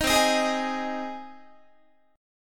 C Minor Sharp 5th C down Guitar; strummed; JGuitar

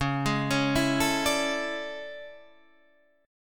A Major 11th, guitar

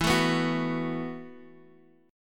E Suspended 2nd Suspended 4th E, Guitar, Drop D; down

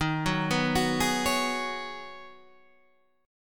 Db 7th Suspended 2nd Suspended 4th

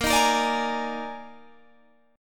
D sharp Major 7th Suspended 4th, A sharp

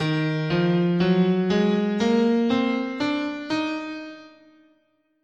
D sharp Melodic Minor, scale

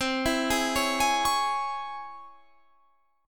C sharp Minor Major 7th, chord

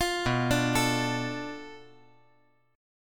B Minor 7th Flat 5th B

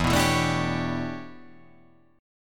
E Minor 13th chord

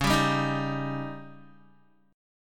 C sharp Suspended 2nd Flat 5th, Guitar; strummed; 8,10,7,8,7,x; 4F,4A,5C,5G,5C,6E

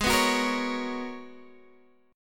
Ab Minor 11th, Ab; Abm11; strummed; x,11,9,11,11,9

